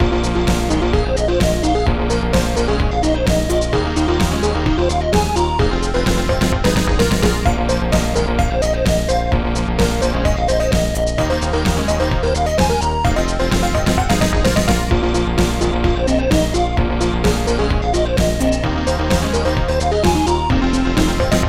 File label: tune; EuroCD 3